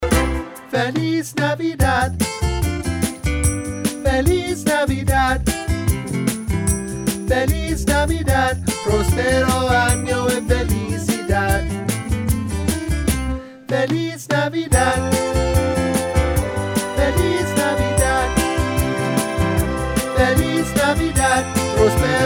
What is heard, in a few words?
Alto, rehearsal parts christmas